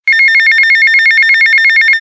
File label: old phone